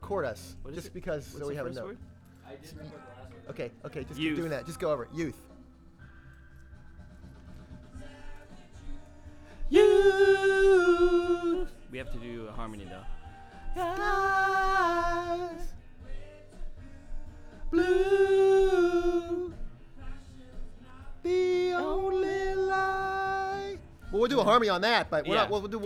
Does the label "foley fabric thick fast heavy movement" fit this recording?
No